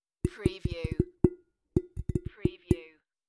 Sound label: Tapping on a Glass Lampshade; beanstalk audio